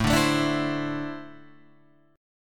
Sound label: A Suspended 4th Flat 3rd A; strummed